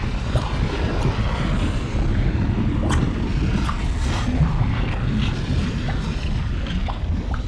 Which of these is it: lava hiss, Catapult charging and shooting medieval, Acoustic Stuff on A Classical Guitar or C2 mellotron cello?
lava hiss